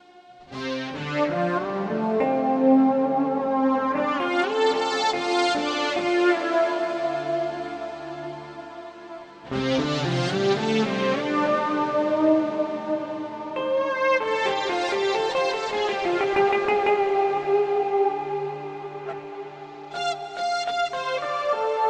Boss SY 1 Strings

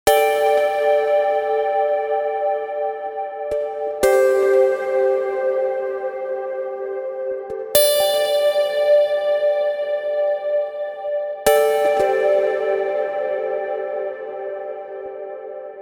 Rapid Pluck Endless; Parawave

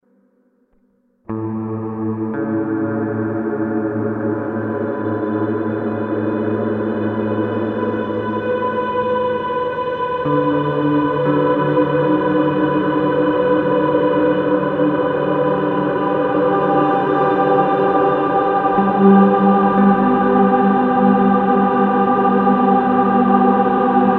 Immerse MKII Sustain

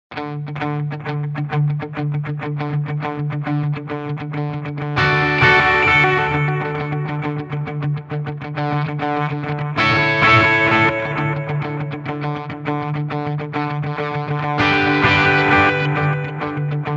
Audified ampLion 2 Memorized Delay; Bartender

